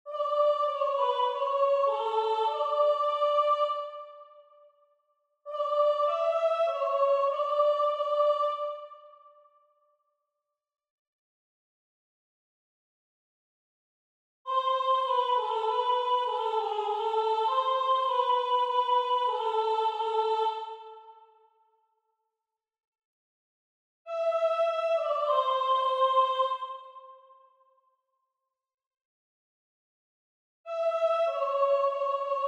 Soprano; Durante; Magnificat

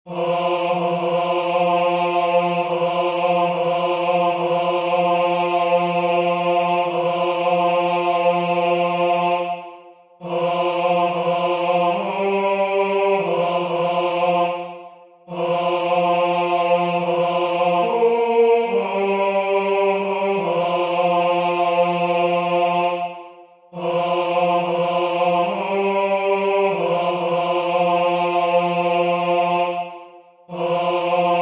Basse; Gounod; Benedictus

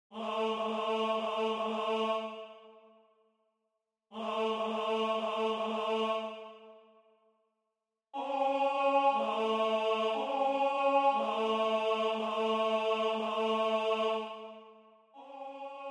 Gloria Tenor; Vivaldi; Antonio Vivaldi; Gloria RV589

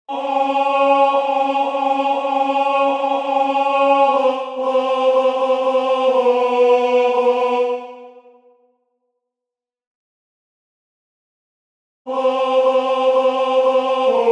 Messe solennelle Et In Carnatus Tenor; Gounod

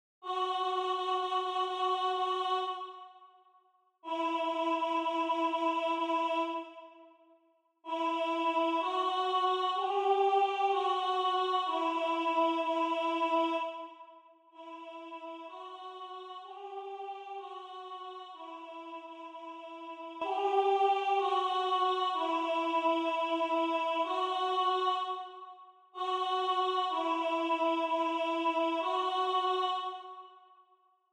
Alto; Antonio Vivaldi; Gloria

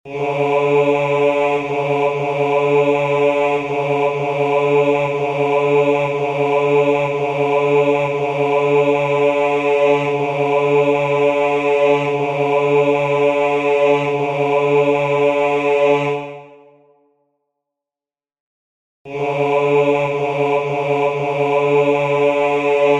Vepres 1610 Basse; Monteverdi; Deus in Adjutorium; Baroque

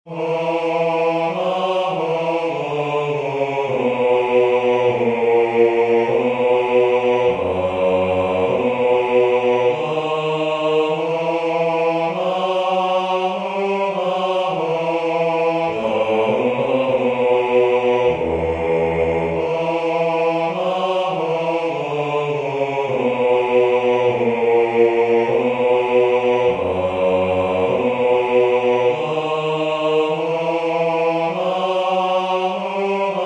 Cantata versus Bass; Basse; Bach; Baroque

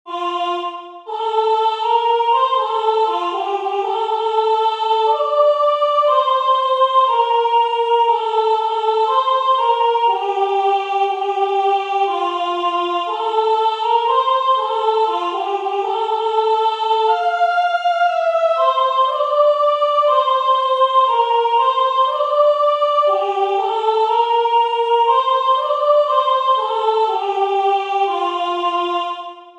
Mon coeur Soprano

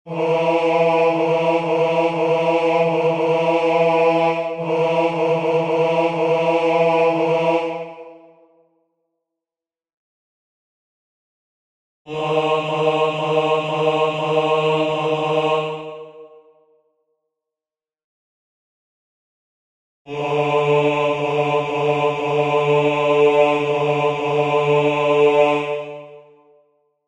Messe solennelle Et In Carnatus Bass; Gounod

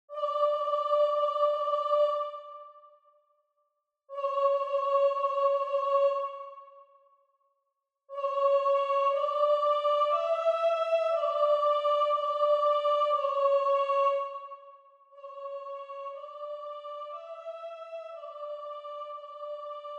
Gloria Soprano; Vivaldi; Antonio Vivaldi; Gloria RV589

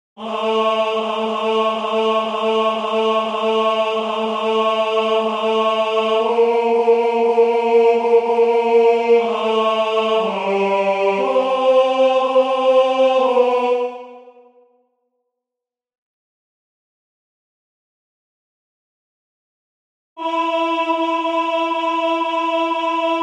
Messe solennelle Et Expecto Tenor; Gounod

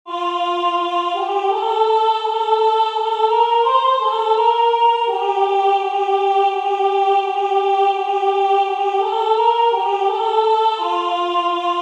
Signum Soprano; Tielman

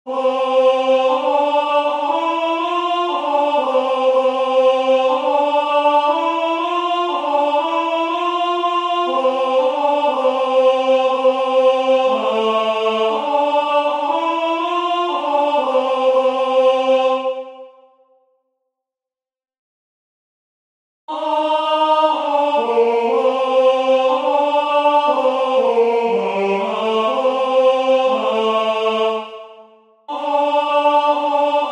Mon coeur Tenor

